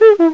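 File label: ear squeeze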